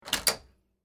Door Open Hard Wood, Foley, Door, Open, Hard, Wood, Back, Door; Miscellaneous Doors; BlastWave